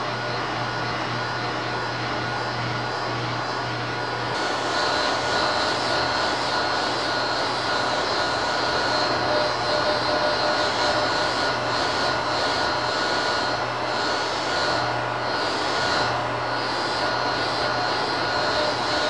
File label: Hair Dryer Sounds; Hair Dryer Collection